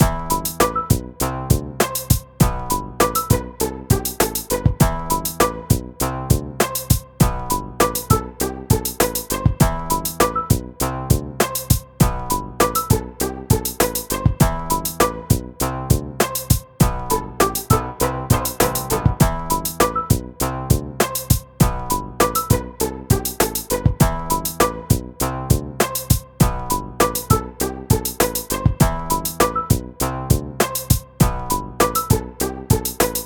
Music Hip Hop 9d Loop

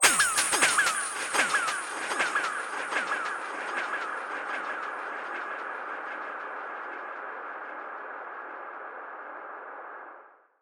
Atmospheric Hihats

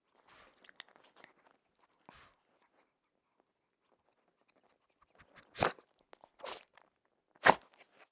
Small Dog Sniffing And Sneezing

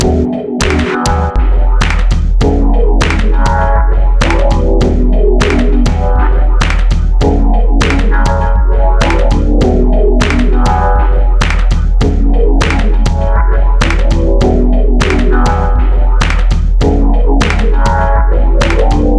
Lunar Vibes

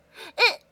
Hurt Unh

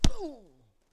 Punch

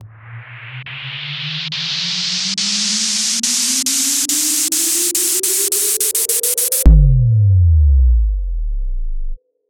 Movie Trailer Impact

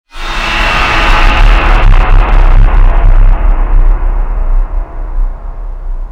Jump scare

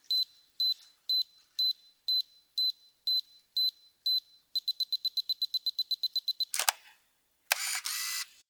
Film Camera Timed Long Exposure Photo

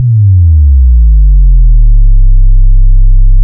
Bass drop

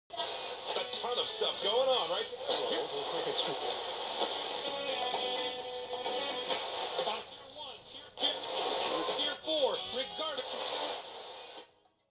Radio Static

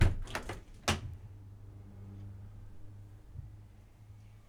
Door Open

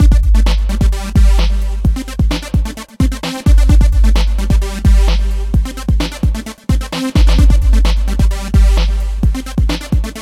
130bpm Outro Music

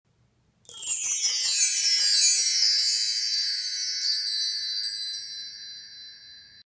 Bar Chimes

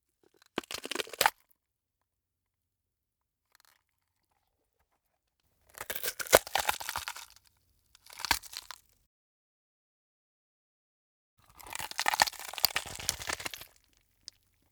Gore Bursting Horror Body Tear Disrupt Break

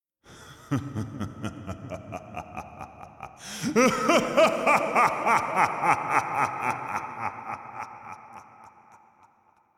Evil Laugh

